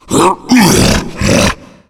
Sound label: tank climb, growl